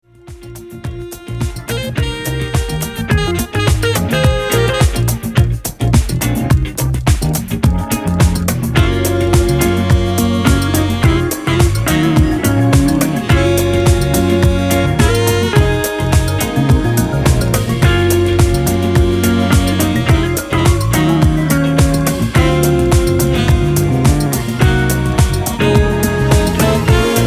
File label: Chill Beach